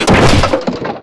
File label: Game, 游戏音效; 特酷声音素材库